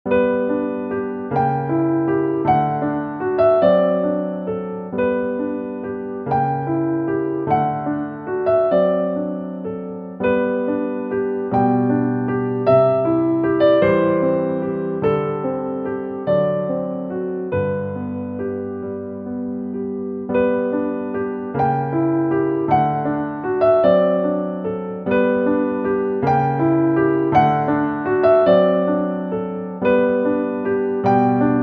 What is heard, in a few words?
piano; schumann